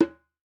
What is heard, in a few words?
WALK DRUM, African Drums; E-MU Formula 4000 Series Vol. 4; Earth Tones